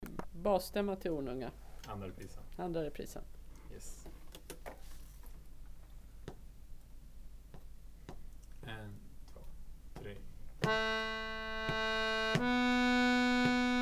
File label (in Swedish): Basstämma; Ornunga